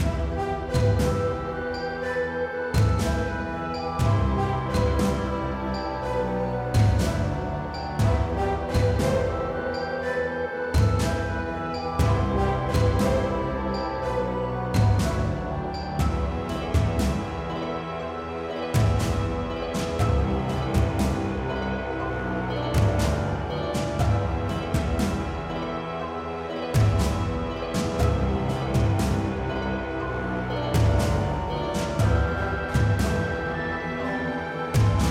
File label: town; brimlock drisdin